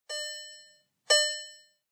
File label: VW Gong